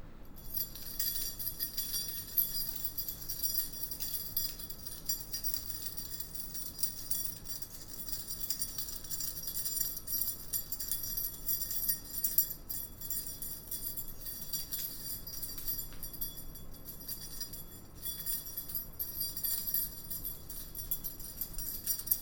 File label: key clk